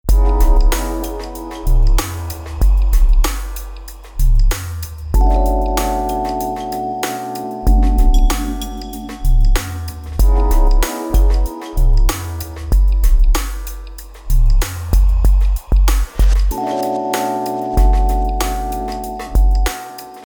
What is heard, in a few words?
bgmusic